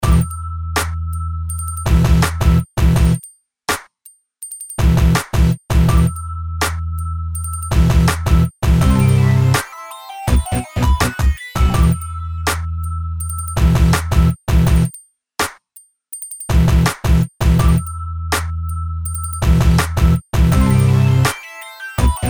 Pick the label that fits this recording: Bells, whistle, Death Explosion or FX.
Bells